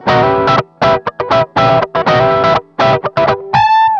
funk A; funk hernia

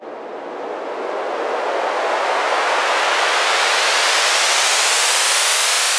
White Noise Up - 160 BPM; Cymatics - Odyssey

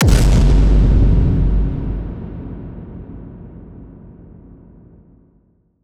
IMPACT vine boom

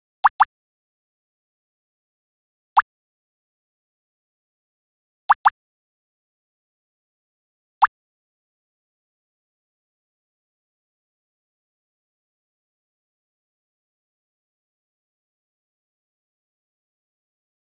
sfx

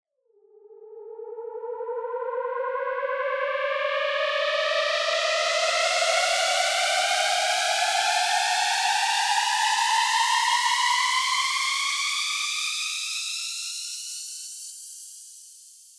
Riser 128 BPM G#; Cymatics - Odyssey

